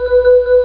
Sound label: HA; SketchBook 2.1